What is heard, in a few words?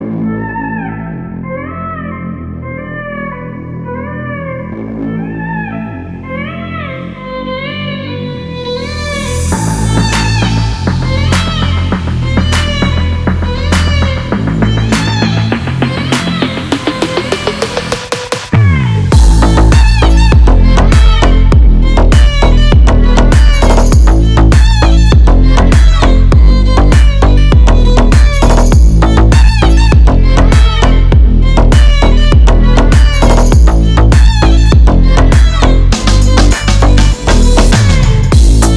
magic violin